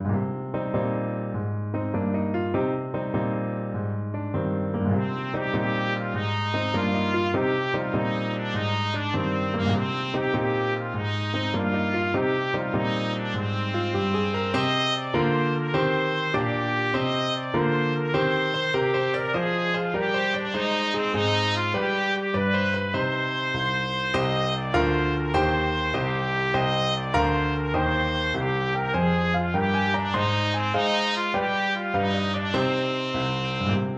trumpet

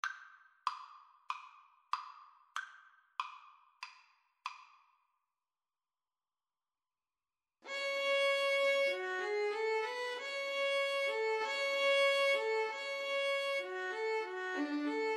scene finale, Violin Cello Duet

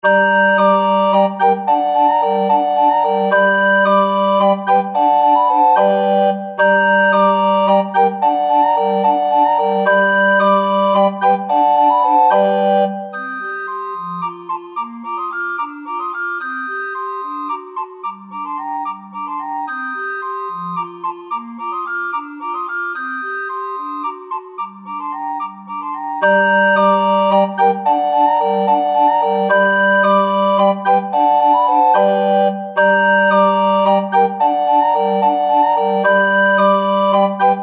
dvorak slavonic, recorder quartet

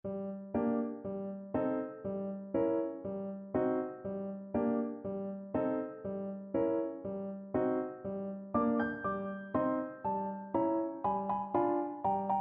Double Bass

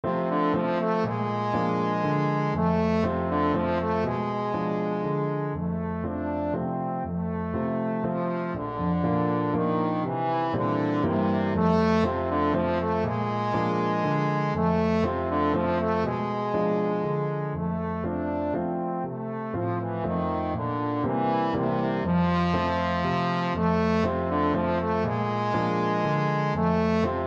trombone

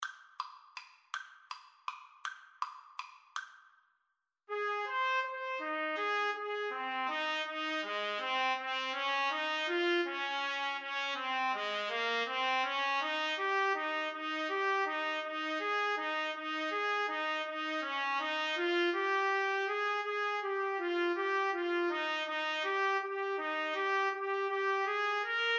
trumpet duet

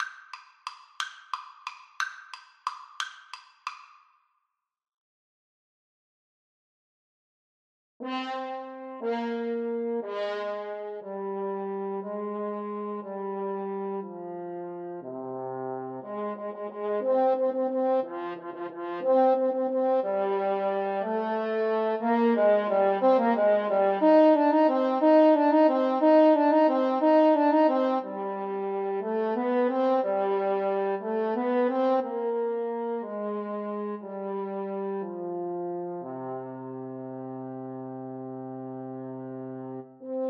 carol of the bells HN duet, French Horn Duet

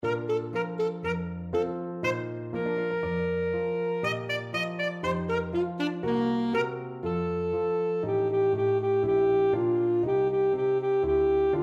reed pipes, saxophone

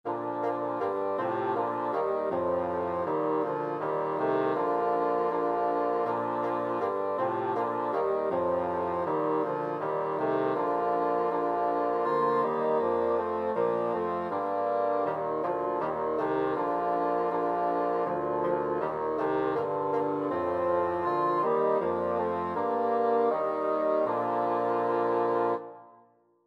good king, bassoon quartet

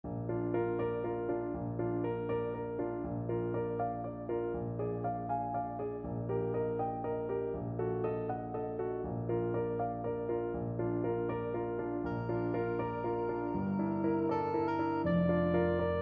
ave maria soprano saxophone; schubert

